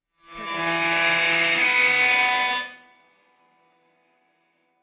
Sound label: horror bowed scare